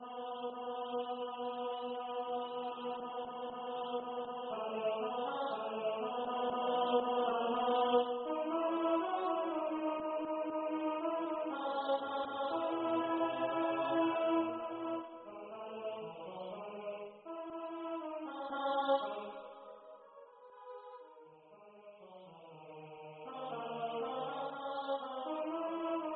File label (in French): Les Fleurs Les Arbres Tenor